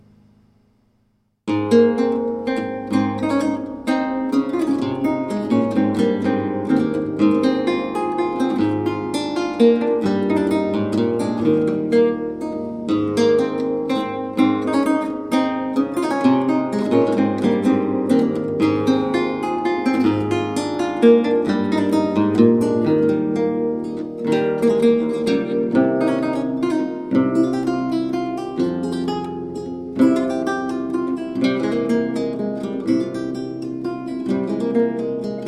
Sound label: Sonata Per Il Liutho A-Dur Allegro, Lute; Johann Friedrich Daube